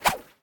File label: Weapon Throw